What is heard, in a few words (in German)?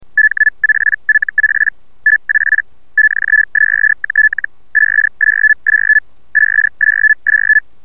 Hellschreiben